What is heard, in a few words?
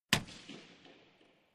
Hockey Puck Hits